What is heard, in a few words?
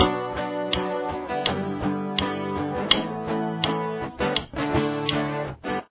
guitar